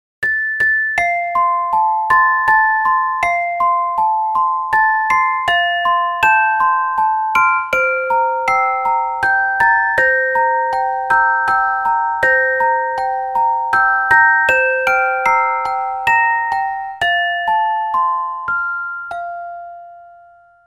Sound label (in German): Celesta